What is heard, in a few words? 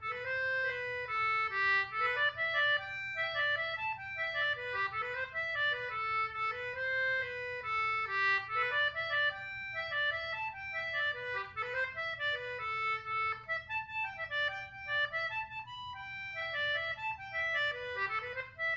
Polka, Celtic Button Box; Bally Desmond II